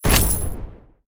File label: Level Unlock; The Adventure Pals